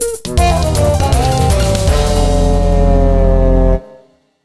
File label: Jingle Bad